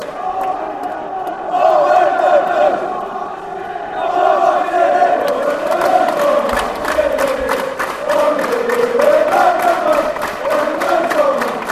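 blues go marching, football songs and soccer chants; cufc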